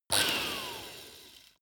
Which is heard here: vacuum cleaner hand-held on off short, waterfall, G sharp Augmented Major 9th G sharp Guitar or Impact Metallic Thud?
vacuum cleaner hand-held on off short